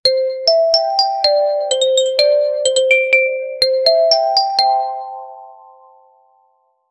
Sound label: an upbeat positive happy, ringtone